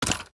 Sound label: mag out; spasi15; tacz; daffas arsenal